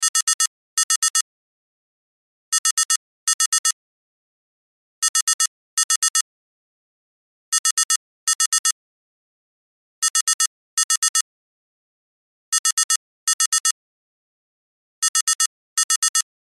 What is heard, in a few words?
Cell Ringtone; Loop; Cell Phones; Blastwave